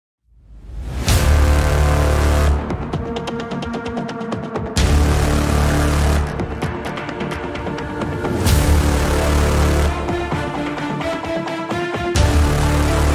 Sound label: Epic Cinematic Tension Intro